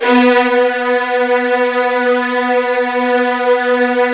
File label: SB1, Virtuoso Strings